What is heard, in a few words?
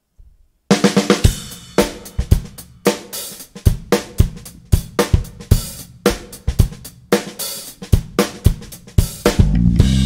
funk, tokyo groove